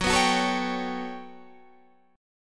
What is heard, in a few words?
G Major Sharp 11th Gb, chord